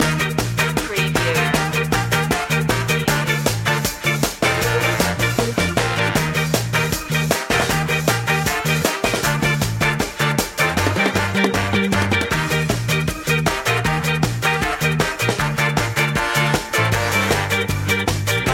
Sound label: ska shimmy loop